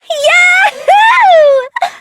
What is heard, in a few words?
yahooo!; ibuki